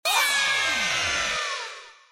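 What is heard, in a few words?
wheel start additional effect; Fruit Fiesta; Wazdan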